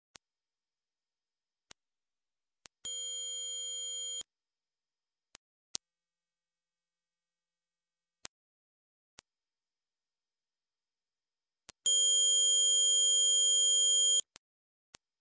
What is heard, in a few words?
Mosquito Killer Sound